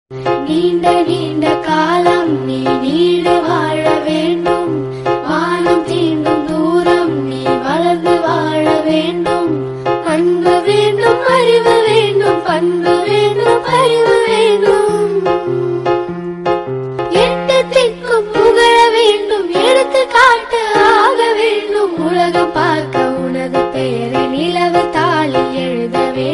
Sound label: happy birthday birthday song